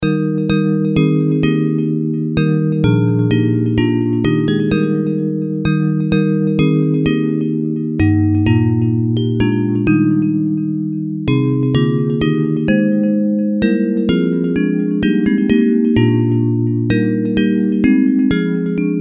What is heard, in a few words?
Bells; Hymns